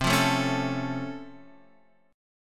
Db Augmented Major 7th, C

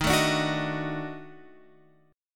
Eb Minor Major 7th Flat 5th Eb down Guitar Open F; EbmM7b5; strummed

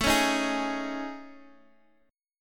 A Major 7th Flat 5th, Guitar, Open G

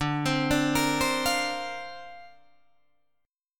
D-Minor 7th Sharp 5th, D, Guitar, Drop D

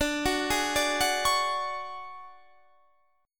D Major 7th Flat 5th Guitar Standard, chord; arpeggiated; JGuitar

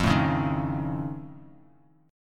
F Augmented 7th F, Bass Standard; strummed; down

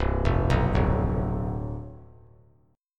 C Major 7th Suspended 2nd Suspended 4th, chord; CM7sus2sus4 arpeggiated; 0,0,2,0; JGuitar

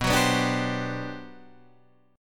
G Major 11th B

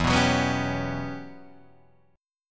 E Minor 6th Add 9th, Guitar, DADGAD

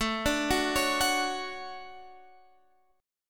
D Major 7th, chord

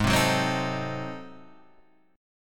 G sharp 7th Flat 9th G sharp, chord; G#7b9 Chord {4 3 4 5 4 5} strummed

